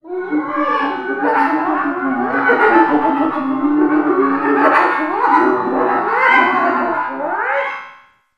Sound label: alien; animal